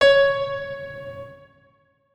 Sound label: notes, keyboard piano; Hideout Furniture